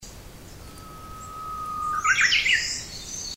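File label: uguisu, Japanese bush warbler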